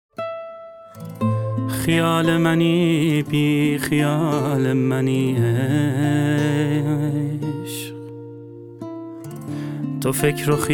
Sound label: Guitar Version